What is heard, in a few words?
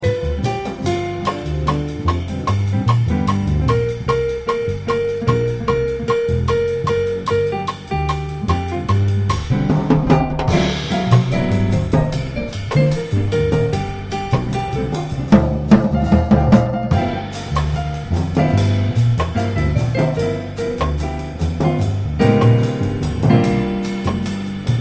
piano solo; bucky